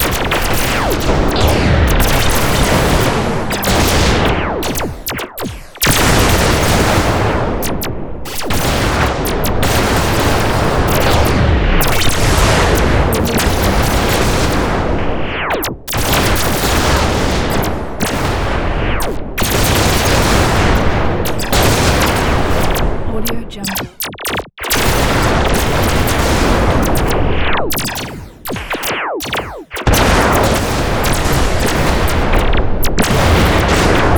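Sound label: ambience laser gun battle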